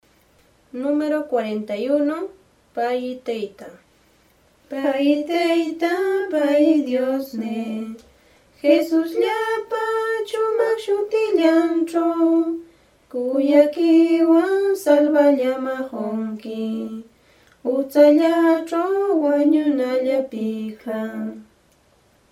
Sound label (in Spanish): cancion